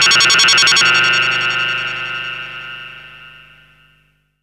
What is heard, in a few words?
Beeps